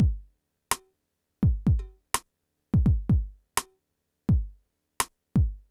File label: PHATT BEA; E-MU Formula 4000 Series Vol. 1; Hip Hop Nation